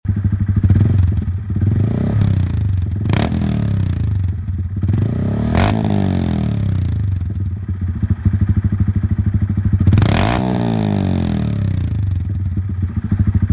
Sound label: honda grom